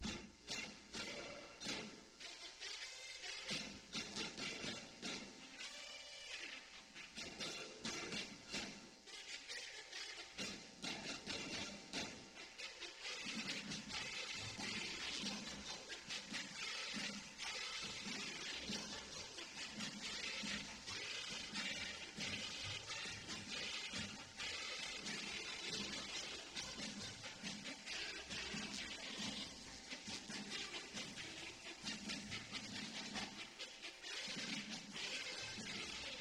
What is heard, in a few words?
Rock; music to sell weyerhaeuser hardwood paneling